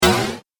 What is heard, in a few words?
Arcade Game Laser Impact; Video Game Sound Effects; Retro Inspired Sound Effects - Think Nintendo, Atari, C64, Etc.; Andrew Fleming